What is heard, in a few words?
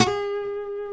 A, Martin, guitars; Spectrasonics; Hans Zimmer Guitars VOL-1; MARTIN HAMMR